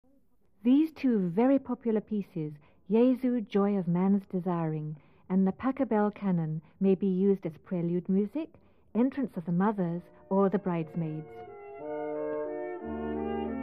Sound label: brass ensemble